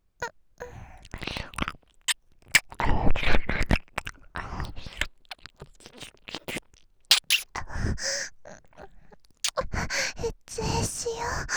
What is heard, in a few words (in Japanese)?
耳舐め 喘ぎ; エッチしよ; DL製作班; ハーレムオナサポ 4人のメイドがご主人様のオナニーをお手伝いするバイノーラル